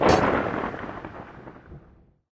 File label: lobby landing; axemurderer; smite; chaac